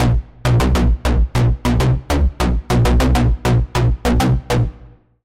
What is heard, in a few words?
Bass, Sylenth1